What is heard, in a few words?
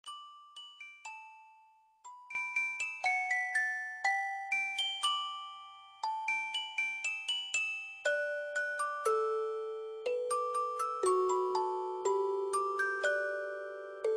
Nice Sms Ringtone